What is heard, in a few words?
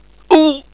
die; svencoop; paranoia; diversant